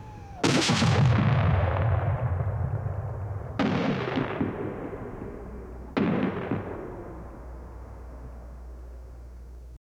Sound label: weapons